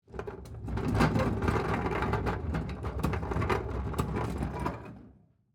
Tool Chest Spin, Industry, Metal, Tool, Chest, Spin, Roll; Hand Tools; BlastWave